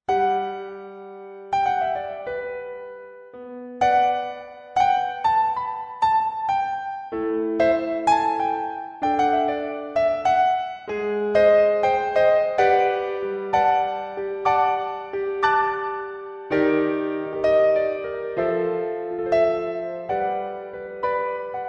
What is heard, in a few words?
Piano, Martini Lounge; Surround Session